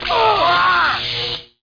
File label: shock